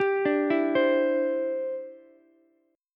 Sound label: E Minor 7th Sharp 5th, Ukulele; arpeggiated